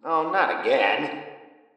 falloff

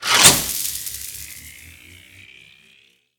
Power Switch On; moul

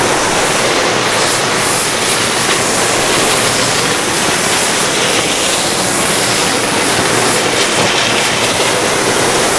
Luminous Urn Loop

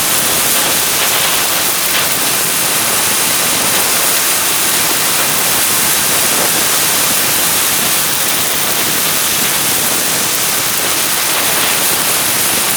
Fountain Sparks; moul-assets

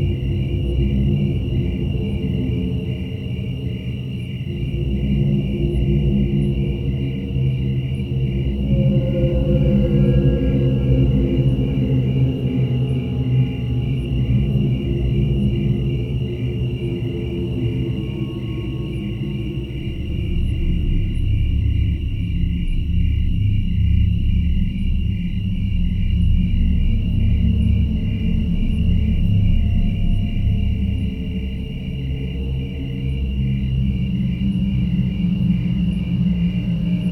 Amb Loop

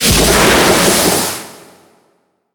Fumerol Blast

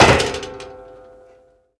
Metal Jump

